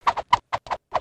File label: soccer head twirl; emotes